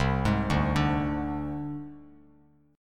C Suspended 2nd Sharp 5th, down, Bass Standard; Csus2#5 arpeggiated; JGuitar

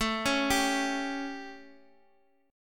A 7th, chord; x,9,9,10

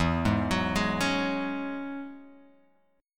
E 6th Add 9th, Guitar, Open G; chord shape

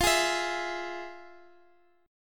F Minor Major 7th, chord

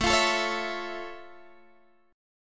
F Augmented Major 7th A

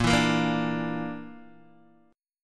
A sharp Major Flat 5th, Guitar, DADGAD

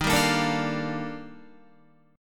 D sharp Major Sharp 11th, Guitar; strummed; JGuitar

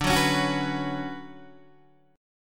D Major 13th Guitar Open D, chord

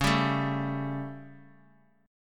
G Suspended 2nd Flat 5th C sharp

